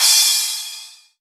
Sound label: Crash, Cymbals